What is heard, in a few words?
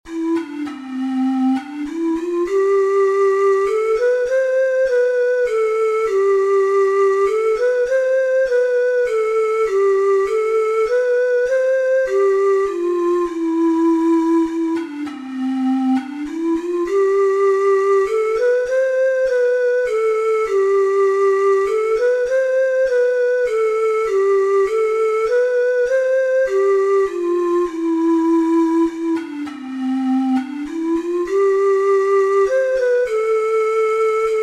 first noel, ocarina four hole